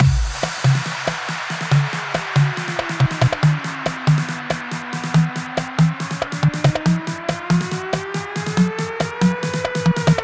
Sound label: 140BPM 16Bars, HandsUp Trance Fills; Zahara; Vengeance Samples